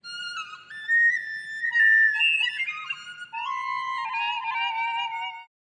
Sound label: bad flute